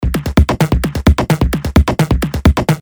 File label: Perc Loop 173